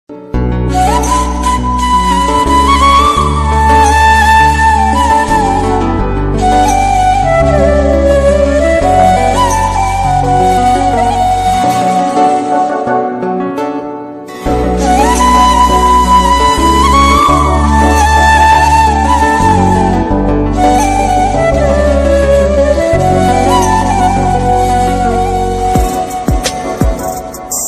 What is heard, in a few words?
Pakistani Ringtone